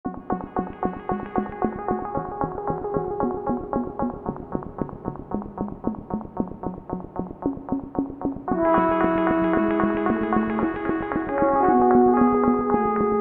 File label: Marimba Roll